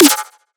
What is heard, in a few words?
FX Snare; Antidote Advent; Infected Track Drums